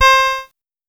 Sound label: Casio VL1 Guitar; Giga Samples Collection